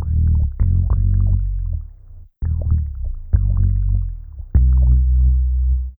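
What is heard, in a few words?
synth bass, drum and bass, 160 bpm, C; eJay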